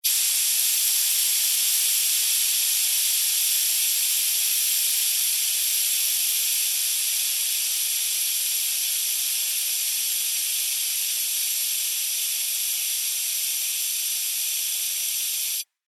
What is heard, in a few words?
Industry Air Leak Gas Long; Air Pumps; Machines